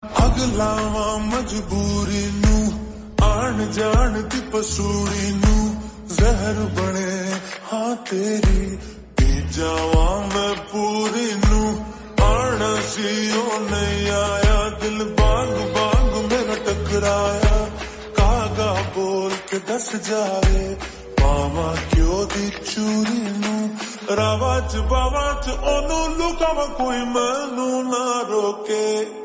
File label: Lofi Song - Hindi Ringtone